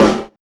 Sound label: strong bottom end steel snare drum g key